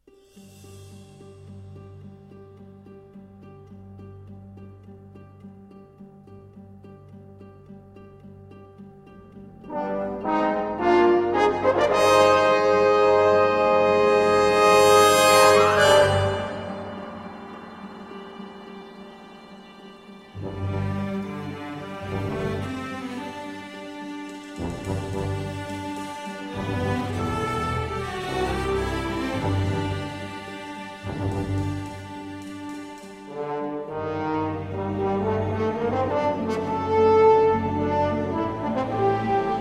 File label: Orchestral